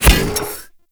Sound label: Step Default